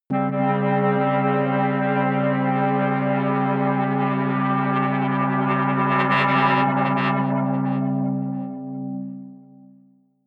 atmospheric feedback synthesizer long high, drones; musikelemente